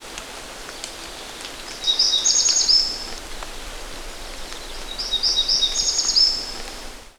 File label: RAIN